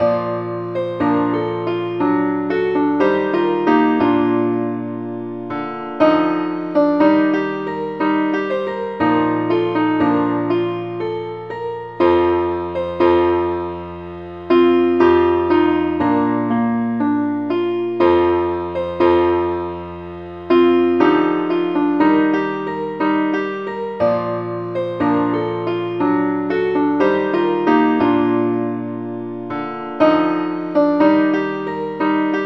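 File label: keyboard Only key E flat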